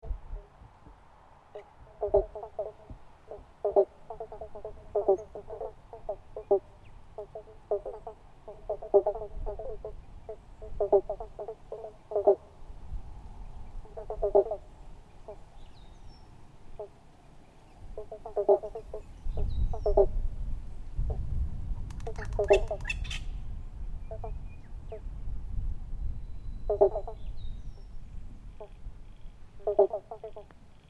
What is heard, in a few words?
Frogs Kangaroo Island Australia